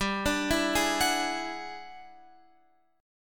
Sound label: E 9th G down Guitar Standard; E9/G